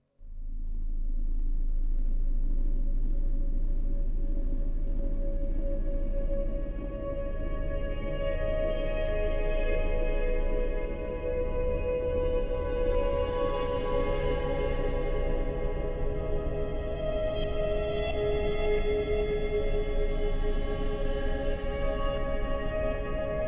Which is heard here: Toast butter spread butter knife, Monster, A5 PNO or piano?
piano